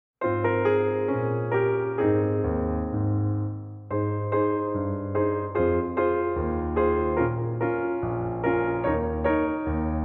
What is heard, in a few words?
Eb accompaniment, accompaniment only